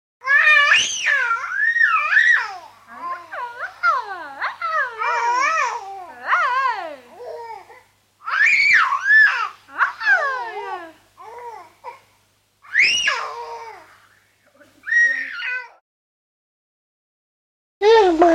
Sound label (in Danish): Tonedialog